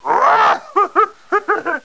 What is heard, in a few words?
laughing; starwars; empire; yoda